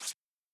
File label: Rip Up Paper